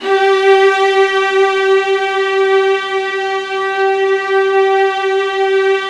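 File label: VIOLINS A5; ILIO; Synclavier Strings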